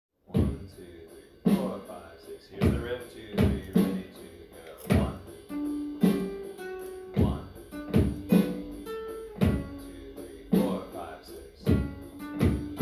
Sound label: riff; Intro Riff (D); ALA Chapel Team